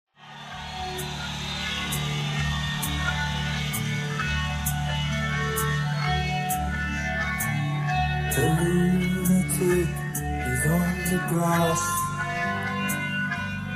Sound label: Backing Track